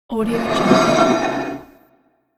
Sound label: palace plates moved on table